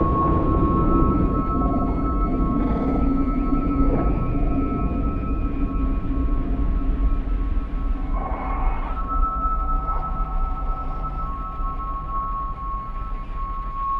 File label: atmos; ゆめ2っき